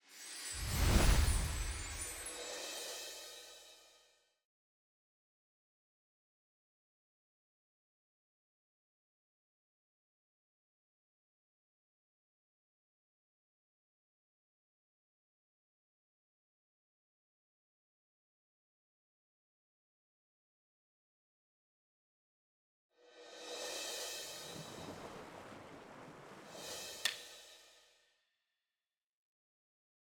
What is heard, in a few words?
SFX; Fall Scatter Sizzle